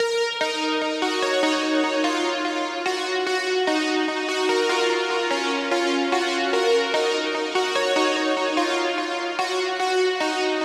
Lead Synth 147bpm Bbm

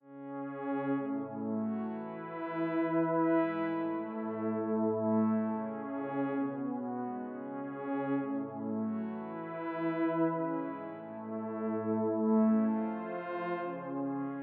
133 Pad Keynote Additive E

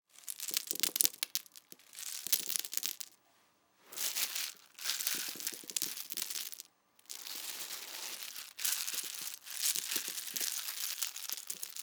Foley, Cereal, Honey Combs, Mvs, Drops

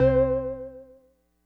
Synth; Mojo

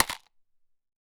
Nut Box

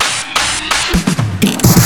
Drum Fills, 128 BPM

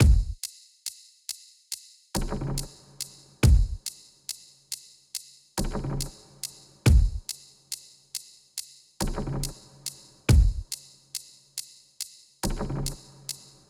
Drum Loop

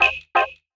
Key Click

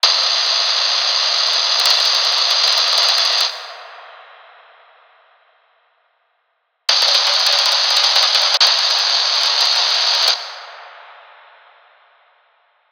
140 Fx Texture

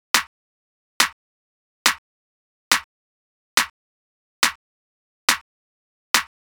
Clap

